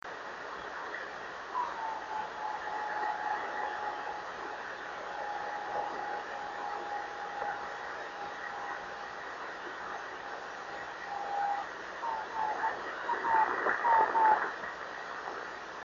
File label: 26700KHz